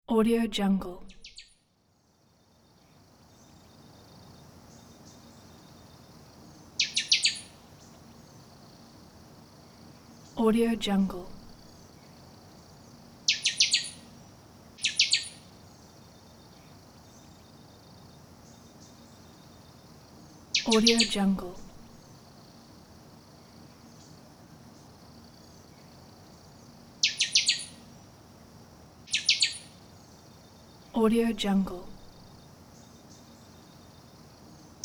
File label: bird